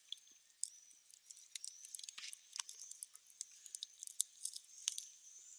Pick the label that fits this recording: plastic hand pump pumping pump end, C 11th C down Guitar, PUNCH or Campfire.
Campfire